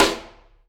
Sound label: Piccolo D, drum